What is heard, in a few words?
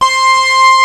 SYNTH LEAD, ENSONIQ VFX; Rubber Chicken Software Sample Bank